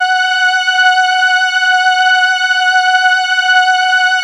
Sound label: SIEL, String Machines